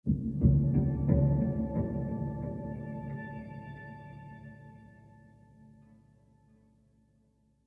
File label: Lap Steel Rhythmic